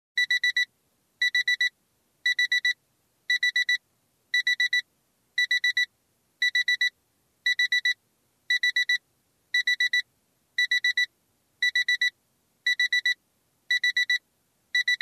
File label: Alarm Clock